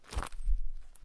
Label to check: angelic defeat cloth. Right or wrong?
Right